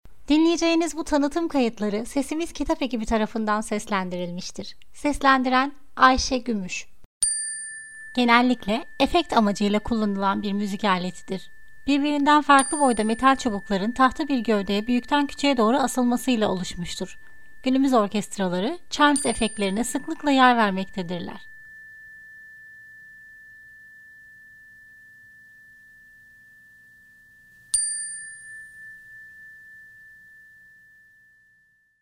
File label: chimes